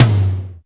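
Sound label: GOGO tom, GO GO DRUMS; Crypton - DrumBase5000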